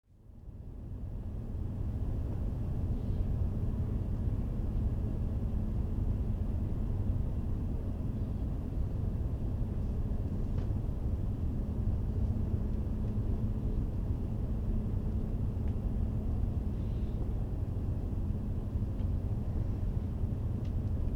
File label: Aircraft airbus 319 or 320 interior landing; Airplane; Airplanes; Tom Hutchings